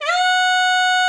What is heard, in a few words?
SLID F#5, ALTOSAX; Xsample VOL-2